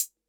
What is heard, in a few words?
808 HH Closed; Concrete Collage Drum Kit; Concrete-808